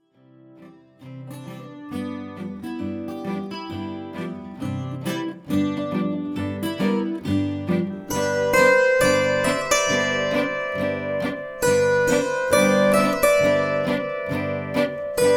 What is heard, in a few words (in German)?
Mach mal Blues Swingblues